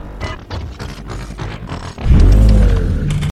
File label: Transform; Lockdown